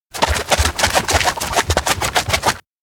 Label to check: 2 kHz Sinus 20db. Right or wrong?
Wrong